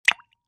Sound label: Splash Sml Plop Drink